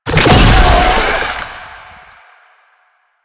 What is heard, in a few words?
Infested Oni Roll Start; Warframe